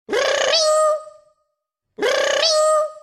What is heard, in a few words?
baby alert tone